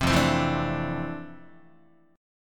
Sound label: F Major 13th Guitar; strummed